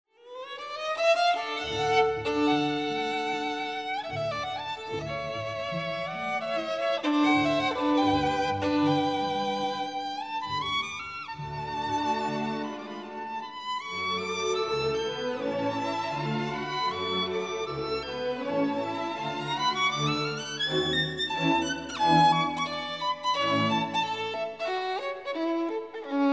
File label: Violin; Tchaik